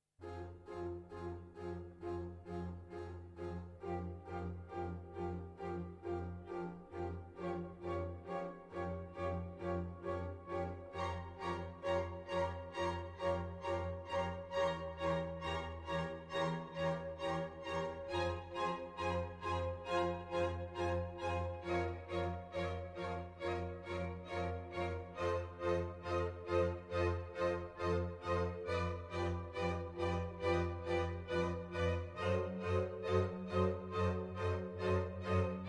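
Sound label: f minor allegro non molto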